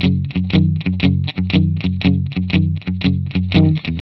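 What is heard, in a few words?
120, Vintage Blues Guitar rhythm; USB Soundscan vol.22; AKAI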